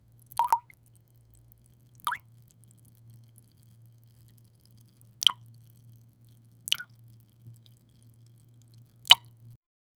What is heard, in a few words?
thick viscous liquid slow, goo